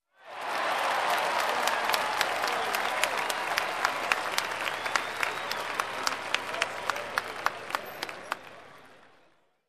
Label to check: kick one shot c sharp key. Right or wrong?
Wrong